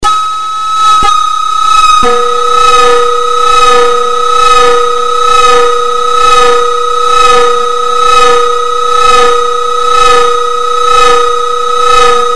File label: Orbit Flute